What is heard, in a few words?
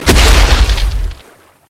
shark down land